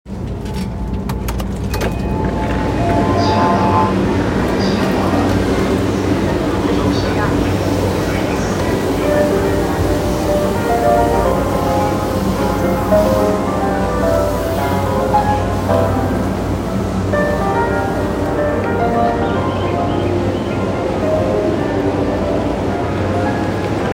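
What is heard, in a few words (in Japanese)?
山手線品川 counterclockwise, train sounds; JR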